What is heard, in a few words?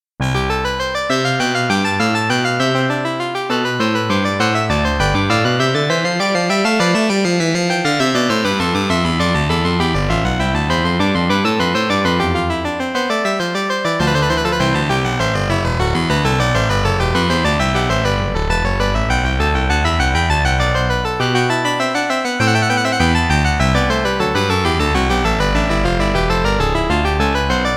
Bach 3 Part Invention No 1 in C; analogsynth; SOUNDLABMINIMARKII